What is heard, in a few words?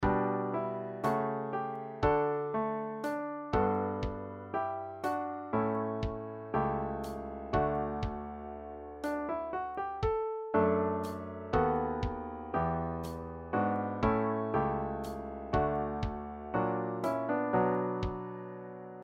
Piano Drum